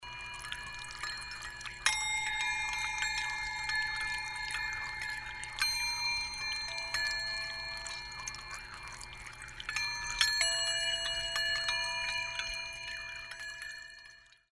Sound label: percussion bell flower fountain tabletop fountain; woodstock